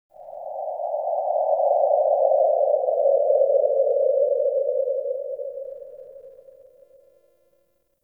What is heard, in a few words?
Forest Cave Howl Mid, Ambience; Warframe; Grineer